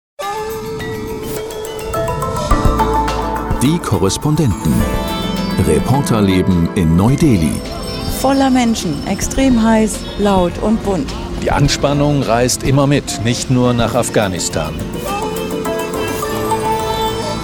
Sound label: Podcast Opener; NDR Info; Delhi